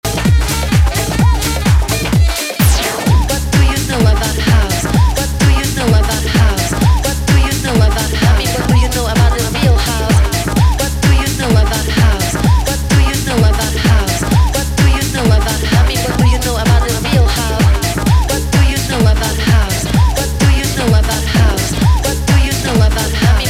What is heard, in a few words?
Jump n Jazz